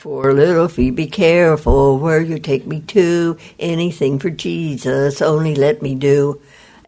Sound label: singing